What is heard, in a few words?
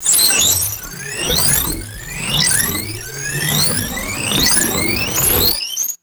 glassy metallic sci fi charge up sweeps; magic scifi